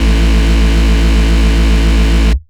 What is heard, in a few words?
Bass Root A